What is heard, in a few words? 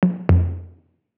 tom pingpong, Toms; one shots; SEISMIC Drum Sample Pack; Slate